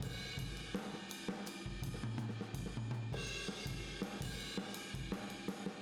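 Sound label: Boogaloo Fill Room mono, 82 BPM; Sonic Foundry; Drums from the Big Room The Mixes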